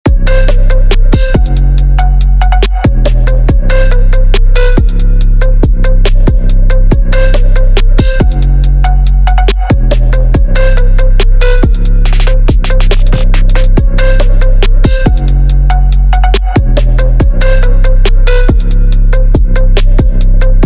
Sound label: intro music black box trap beat